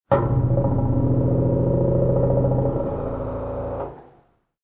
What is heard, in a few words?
nuclear pump; ambient; Counter Strike Model Pack; CS:GO; nuke